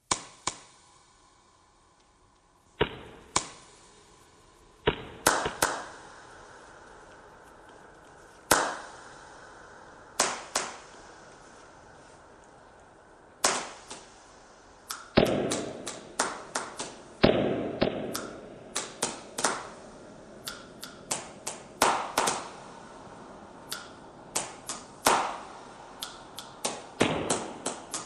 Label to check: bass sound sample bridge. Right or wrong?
Wrong